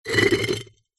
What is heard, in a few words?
household pot ceramic base lid scrape